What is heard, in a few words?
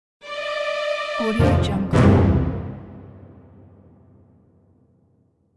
terror intro